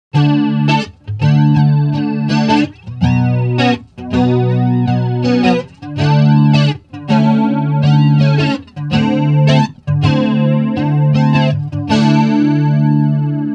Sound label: Flanger